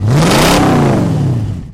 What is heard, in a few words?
boost activate; Gotham City Speed